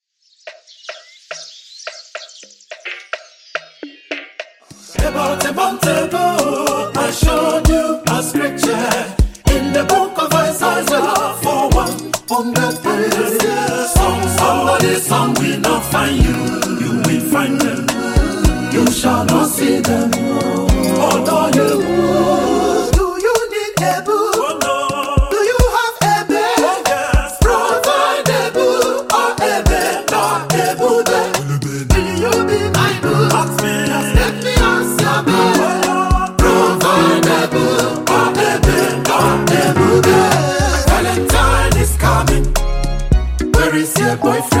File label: Oriental Choir